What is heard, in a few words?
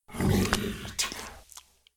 Ghoul Growl; SyrinScape; East Ward